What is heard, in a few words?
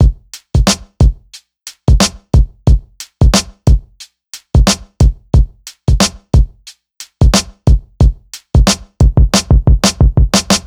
Storm C, hip-hop